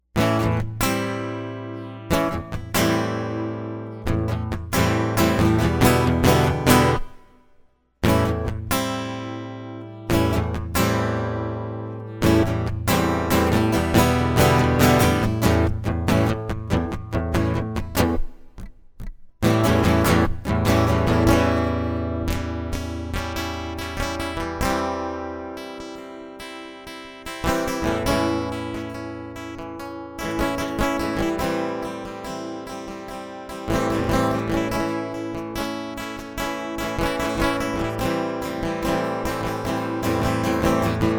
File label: acoustic